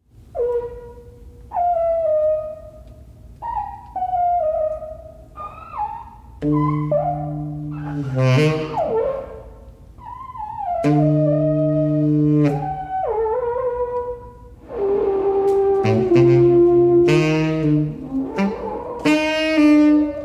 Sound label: Sax